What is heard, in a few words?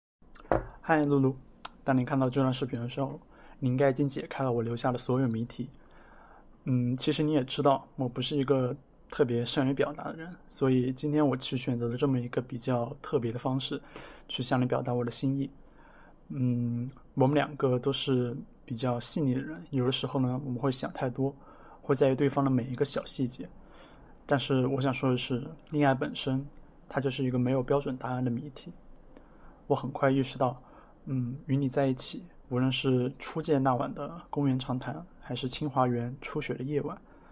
vocal